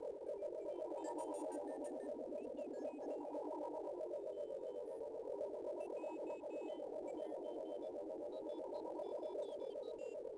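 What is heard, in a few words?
telemetry loop; Star Wars Obi-Wan; XBOX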